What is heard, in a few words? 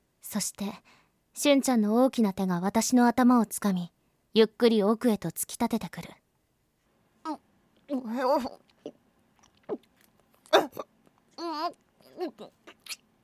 dog sample